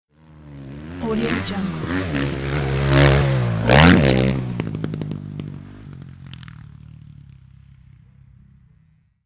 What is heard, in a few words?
passing by motocross motorcycle